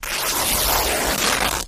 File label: Cloth Rip Fst High